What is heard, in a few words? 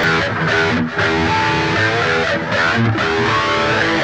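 Guitar Rockin; Sonic Foundry (Sony Creative Software); Ugly Remnants VOL-2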